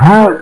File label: jump; madpear